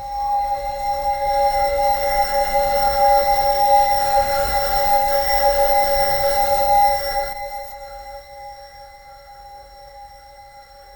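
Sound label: flickering neon buzz electric; rising tension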